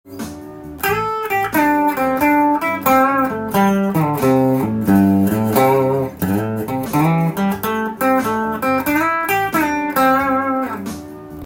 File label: blus hane solo, guitar; blues guitar rhythm; blues guitar rhythm problems